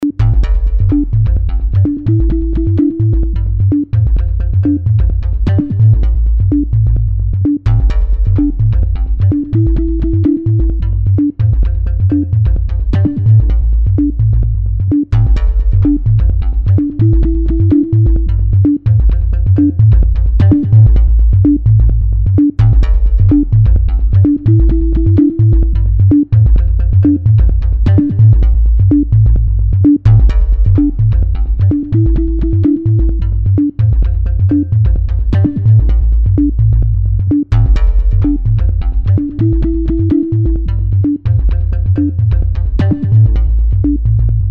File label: Techno